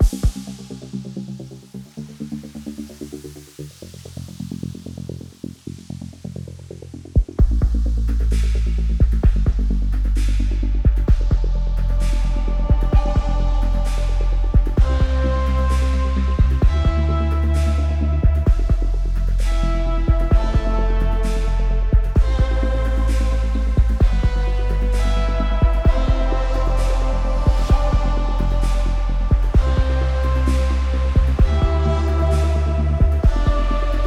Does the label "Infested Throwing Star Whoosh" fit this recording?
No